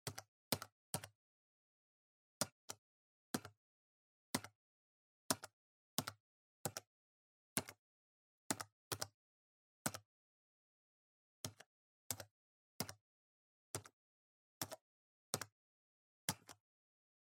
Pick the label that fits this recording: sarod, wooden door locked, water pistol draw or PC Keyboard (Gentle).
PC Keyboard (Gentle)